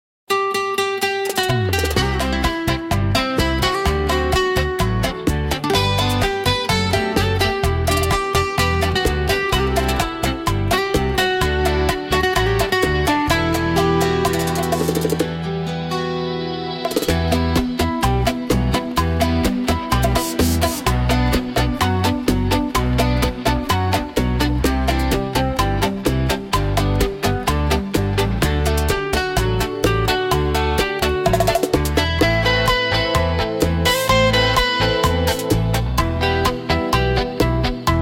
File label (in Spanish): Ritmo Tropical; teneryfe bailando